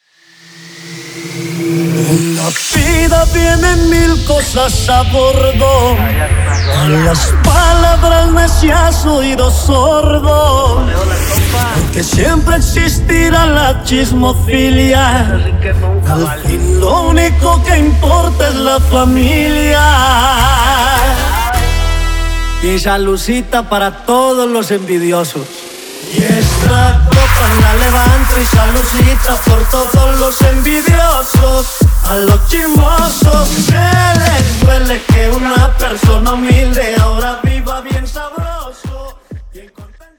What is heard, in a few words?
Acapella 128 BPM; Acapella Starter; DJ Ax Pro